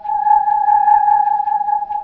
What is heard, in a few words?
ufo fly; T-Clock